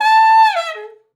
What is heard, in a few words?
sax a5, Saxophone slides; Session Instruments; Best Service ProSamples vol.42